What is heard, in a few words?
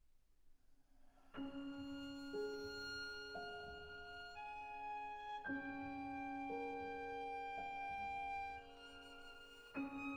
24 Preludes for Violin and Piano, Op.46- 15. Adagio sognando